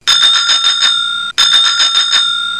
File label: call; OrderPin POS